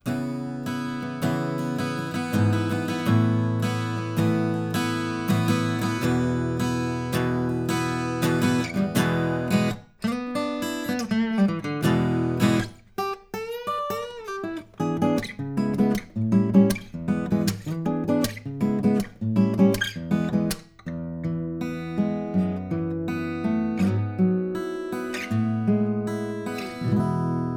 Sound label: Ac Gtr Shoot Gtr U87